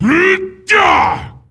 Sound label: Attack Heavy; Jaraxxus